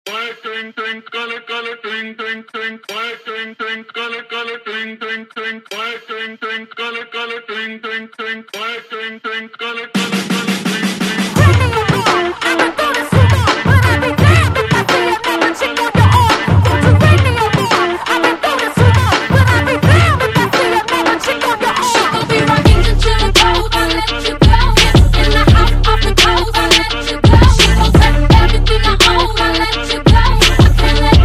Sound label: Acapella Out